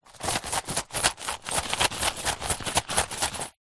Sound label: purse pouch leather containing coins and notes shake; zapsplat; foley